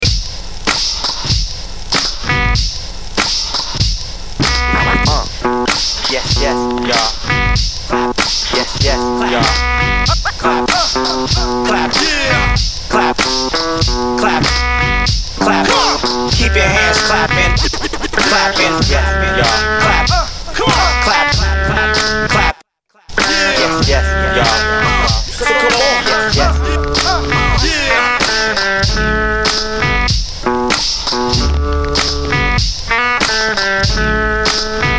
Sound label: Clap Clap